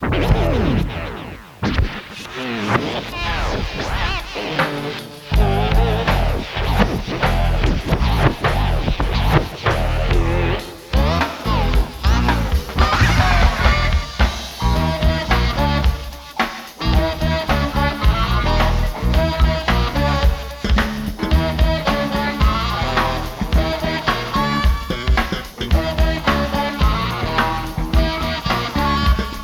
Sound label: Speed Control Funk